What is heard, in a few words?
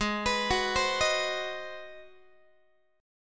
G sharp 7th Sharp 9th, Guitar; G#7#9; x,16,14,16,16,14; arpeggiated